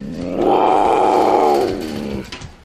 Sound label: Puma Growl Cage Noise; big cats